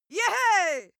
female cheer; schreie; menschen

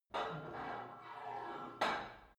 put weight onto barbell reverby; sport freizeit; fitnessstudio

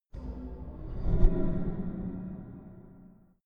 strong metallic impacts, metall

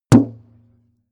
metal impact dull

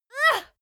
female moan pain short soft; koerpergeraeusche; menschen; soundpool

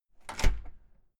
entrance door close slam outside

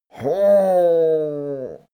male grumble happy; menschen; koerpergeraeusche; soundpool

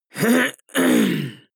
harrumph male hard; soundpool

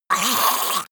monster choking high; comedy-cartoon; soundpool

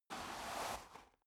step boots gravel light scuff; schritte

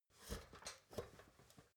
step ladder metal scrape single

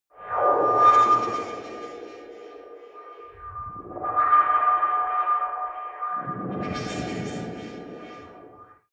mysterious chiming; swooshes-swishes

